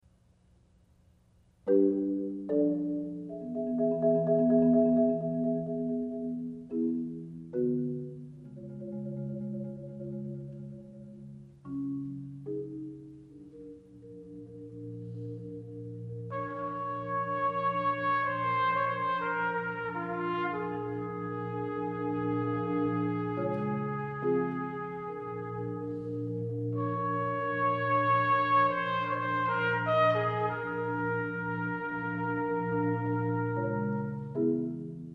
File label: Tpt Sonata